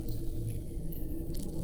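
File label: Music Dpad Evaporate 145; From Dust; PS3; Xbox 360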